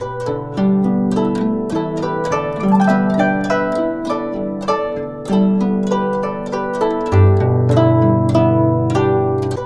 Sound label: GUCHIN 110 G; INIS - Opium; GUCHIN 100-G